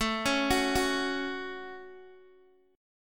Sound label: A 6th, Guitar, Open D; x,12,11,12,9,x